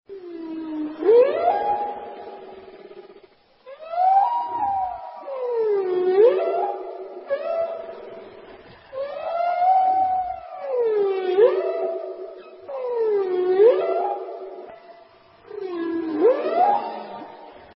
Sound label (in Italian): balena megattera